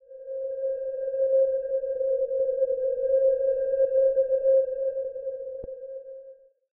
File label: void call; Barotraumatic; Voidstalker